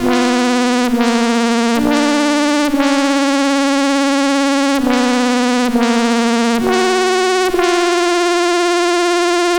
C64 Synth; 8-Bit; Chiptune; Commodore 64

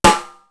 TONAL SNARE; East West; Global Sounds

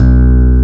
E-BASS H-1; Best Service; Alpine Volksmusik

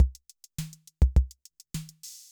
16 beat, Yamaha MR-10

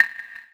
Clave; Boss; Zero-G; Soundclash Nu Dance Electro; Acidized Wavs; FX Atmospheres

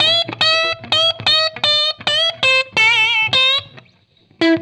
BLUES GUITAR; Sonic Foundry (Sony Creative Software); Vital Drums

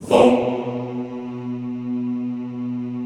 Classical Choir; Best Service; Extended Classical Choir

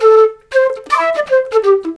flute, disco; Best Service ProSamples vol.48 - Disco Fever; AKAI

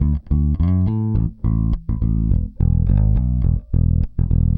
Pop, Bass

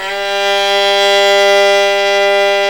VL TEN G 2-L, STRNGS

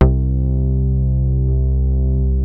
MOOG, BASSES; Kid Nepro - Elite

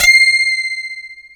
DS8 C 6, Korg; Back In Time Records; Korg Universe VOL-1

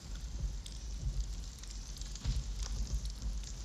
Ambience Loop, outdoor

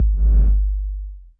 SFX K808; Masterbits - Soniq Elements

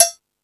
Percussion, Drum Machines E-MU Pro-Cussion

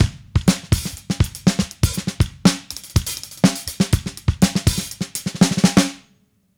Drums, 70s Analog Funk; Sonic Foundry (Sony Creative Software); What it Is!; Super Brown

